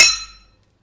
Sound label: Glass Metal, Collision Sounds; clockwerk opensim